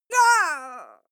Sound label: female fight moan pain; schreie; menschen